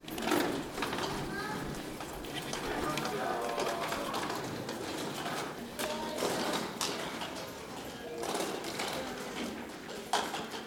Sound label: airport